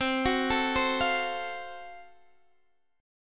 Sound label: Gb Minor Major 7th Flat 5th, chord